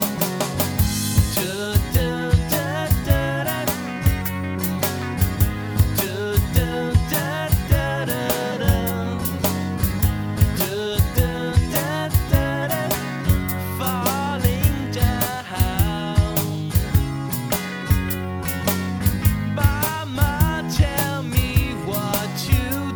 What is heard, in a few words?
Drum, Before; VoxengoDrumService